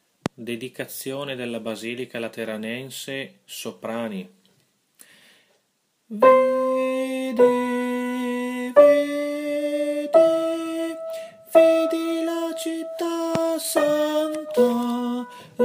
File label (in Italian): Soprani; Dedicazione Basilica